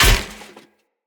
Sphere Polearm Medium Impact Flesh Armour Hollow; Weapon Impacts; Player; Combat

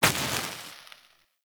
Enemy Burn Death; Warframe; Caliber Chicks Minigame; Weapons; Hit Effects

